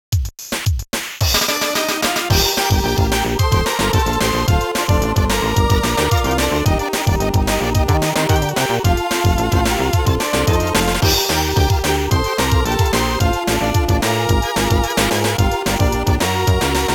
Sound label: Ending - Happy